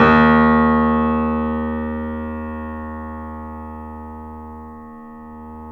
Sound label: D# -L, The Piano; InVision Interactive - The Piano